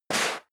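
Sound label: step leather shoes sand slide short, footsteps; schritte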